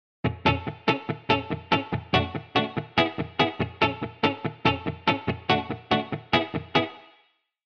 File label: guitar pattern 2 gt